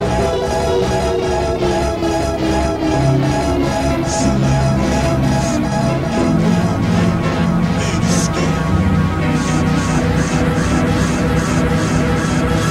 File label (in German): minisynth; 50er